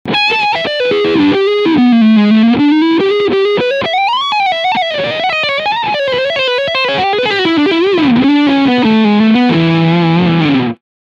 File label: Leadsound Front PU